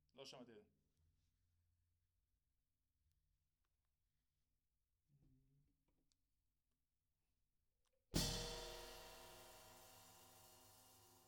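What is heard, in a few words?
SNR Top, snare top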